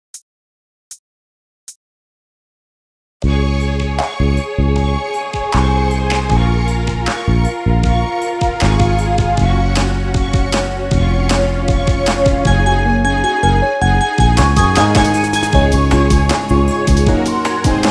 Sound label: denpa ballad inst